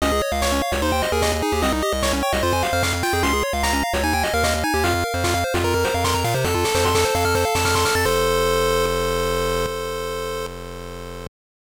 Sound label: Jingle; kettou beast wars beast senshi saikyou ketteisen 1999 gbc